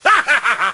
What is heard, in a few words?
woodsman atk